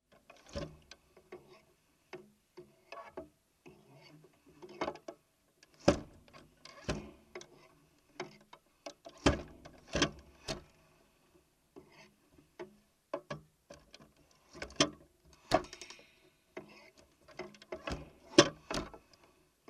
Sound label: floor loom at the handweaver s studio